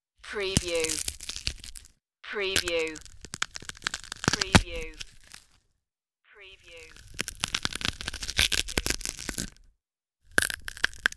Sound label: eating bones